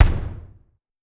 Kick, Clavia DDrum-AT, Pitched Down

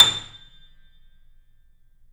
A, The Piano; InVision Interactive - The Piano

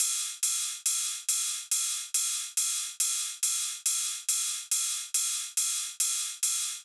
drum Loop 140BPM Ride; Multi Loops

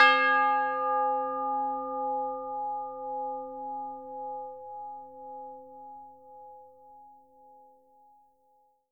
tubular bells hit G#4; AO bell

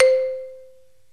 MARIMBA VIBES

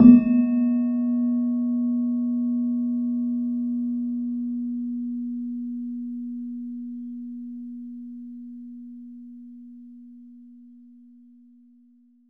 PELOG A#1, PELOG BASS; Soundscan vol.60; AKAI

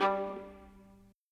Viola, Orchestral; Sonic Foundry (Sony Creative Software); Orchestral VOL-2; Modern 09

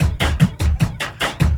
LOOP, Drum & Bass, 150 BPM

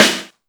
Snare, Yamaha DD-20

